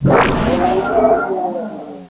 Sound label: Jam Fire; XWing